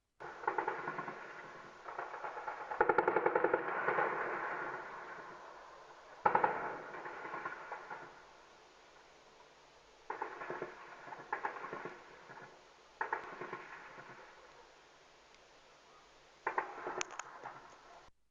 nochnaja perestrelka

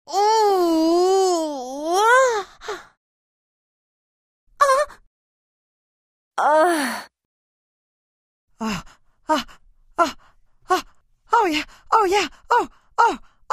zvuk zhenskogo orgazma

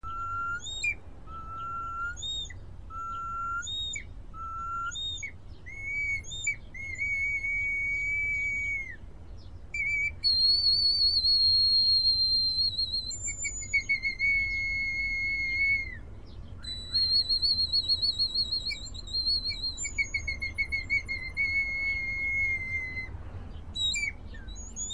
golos sviristelja

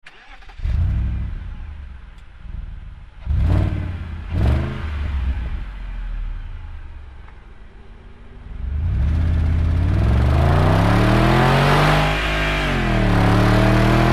zvuk vyhlopa mersedesa

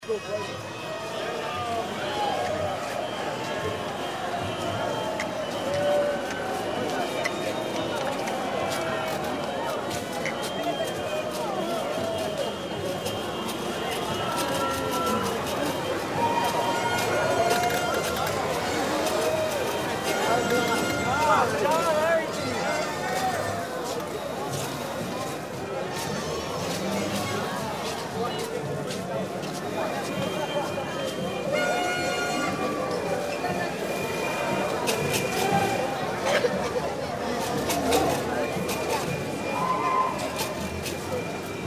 zvuk ulicy v novogodnjuju noch

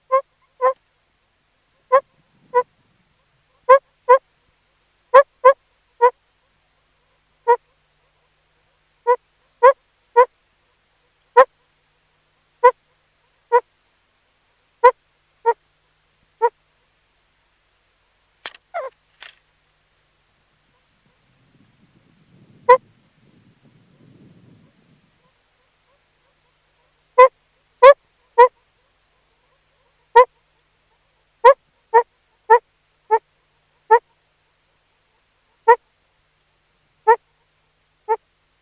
zvuki surikata ohrannika

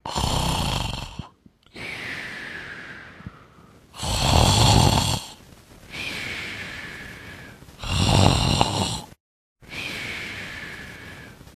hrap so svistom

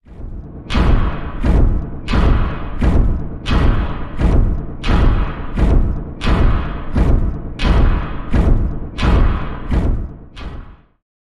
bolshoj porshen

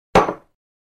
kruzhku stavjat na stol

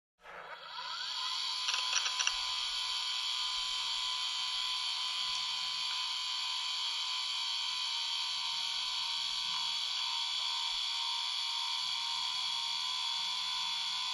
vkljuchenie kompjutera i zhestkogo diska

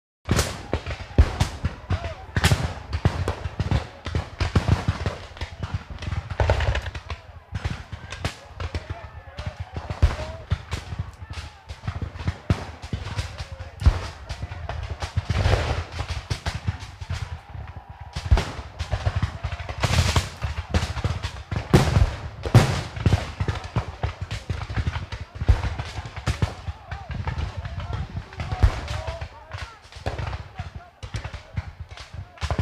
srednevekovaja perestrelka iz mushketov

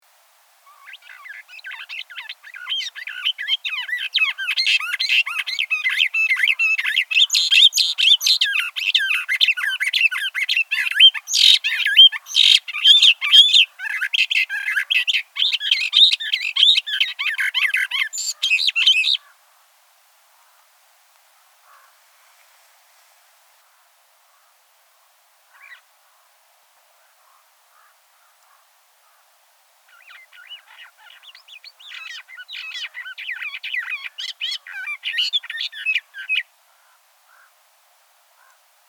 zvuki kaktusovogo peresmeshnika

